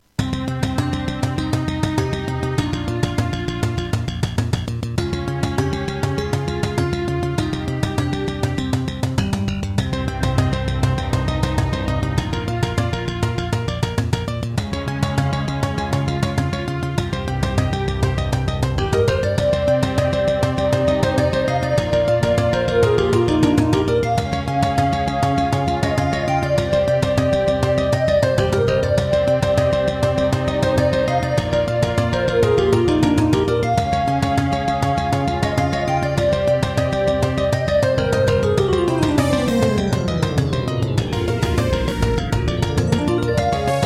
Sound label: Introduction, Roland SC-8850; Hocus Pocus